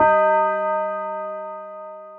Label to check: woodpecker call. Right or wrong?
Wrong